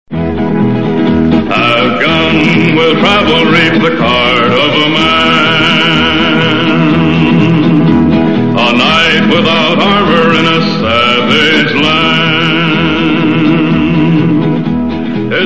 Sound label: tv theme